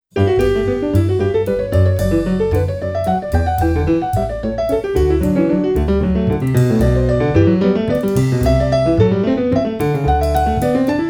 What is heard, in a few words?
Variations, for keyboard